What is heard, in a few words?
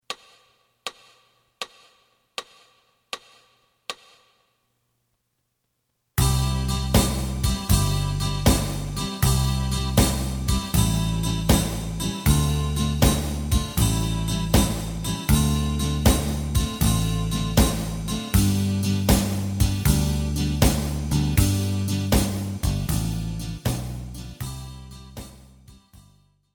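lead guitar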